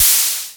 Cymbals, Electro

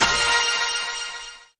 metallic

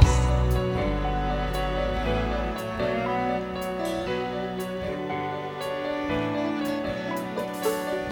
piano, Sad Loops

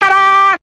taadaa, Voice; ooh ahh

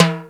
timbal

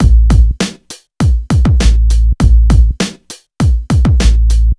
heavy, Drum loops Electro

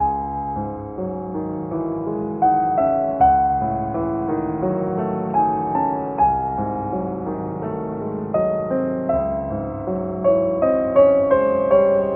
piano pensive, Loops Sad

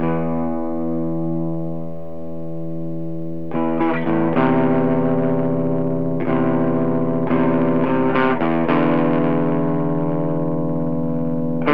guitar, Sad

